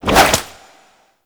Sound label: Infested Tendril Whip, melee attack; Zeke; Warframe; Infested Lich